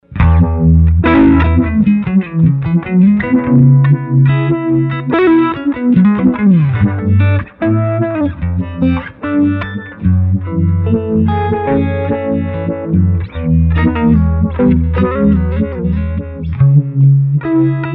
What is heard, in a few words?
med speed full intensity